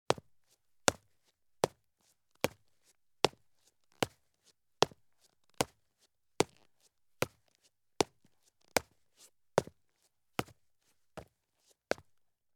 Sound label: Police Boots Take Slow Steps on Concrete Stairs; Sound Ideas; Footstep Sound Effects